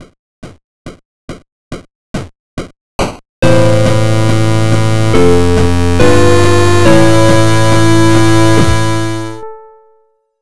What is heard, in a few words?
song